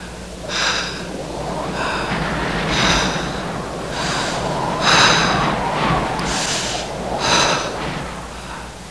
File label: breathing; quake2